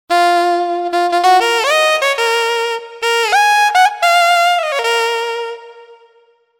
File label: Alto; TURBO VL